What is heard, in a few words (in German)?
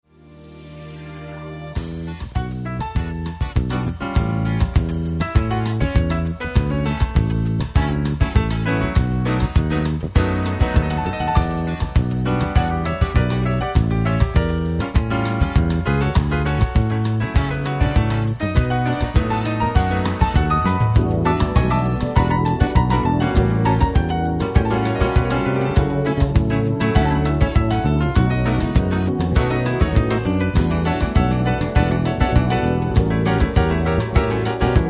solo klavier; jazz